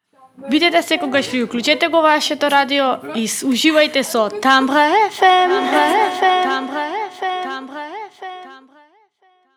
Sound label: Jingle; Europa; urmeta